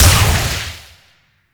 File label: Incarnon Shot Small; Weapons; Warframe; Duviri; Incarnon Adapters